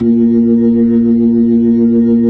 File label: B-3, Jazz; Roland LCDP10 Keys of the 60s and 70s 2; Jazz Ch 16